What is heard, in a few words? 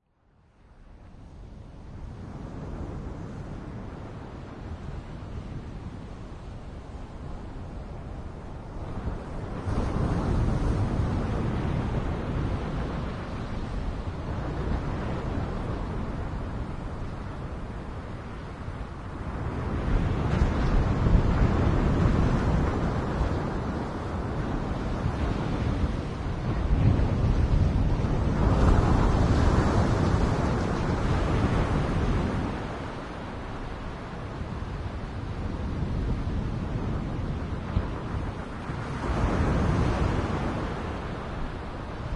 nature waves seashore